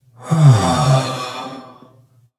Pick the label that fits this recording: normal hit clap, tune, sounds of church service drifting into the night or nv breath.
nv breath